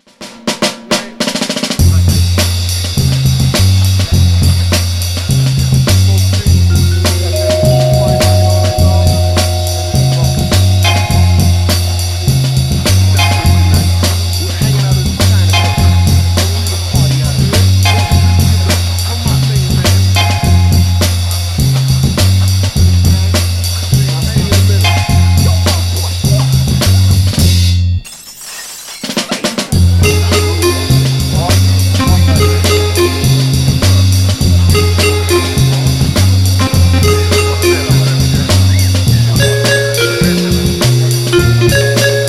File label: Organ Vibes